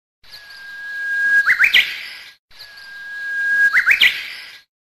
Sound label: Bird 3D Sms